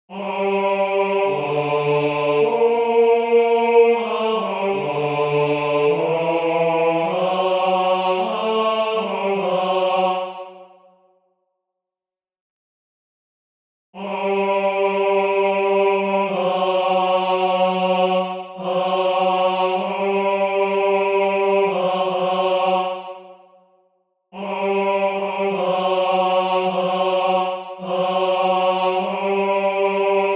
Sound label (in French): Basse, chorale; Pie Jesu